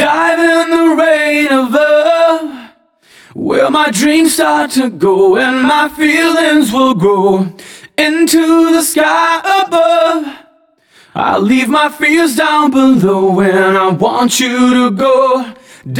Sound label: Chorus, rock alternative; Сэмпл вокала (Rock Alternative); 120 BPM; Vocal